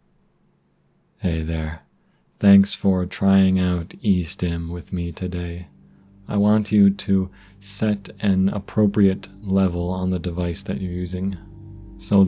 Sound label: E-Stim Test Audio Brainwave